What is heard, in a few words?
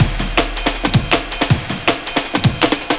Careless Break, 4A, Jungle Breaks; Blu Mar Ten - Jungle Jungle - 1989 to 1999 Samplepack